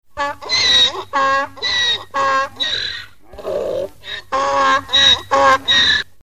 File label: Donkey